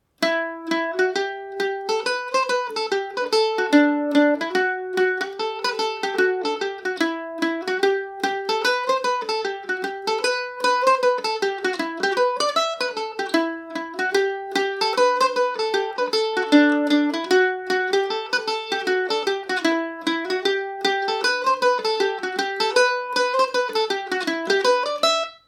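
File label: E minor, Irish mandolin; pride of petravore version 2 revisited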